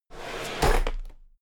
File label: drawer cabinet equipment open heavy